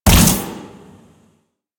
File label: Layer Shot, Quill Dart Gun; Hystrix Prime